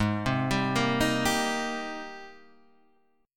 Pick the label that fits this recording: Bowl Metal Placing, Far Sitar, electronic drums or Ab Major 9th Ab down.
Ab Major 9th Ab down